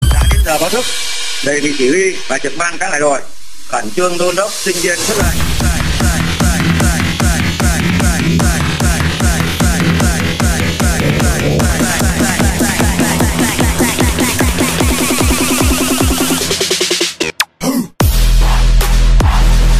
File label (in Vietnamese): da den gio bao thuc